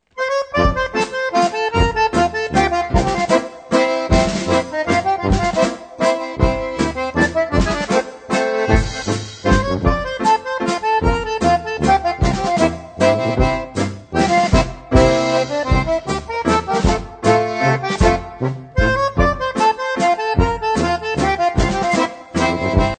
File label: WALTZ